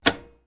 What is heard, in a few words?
Switches Industrial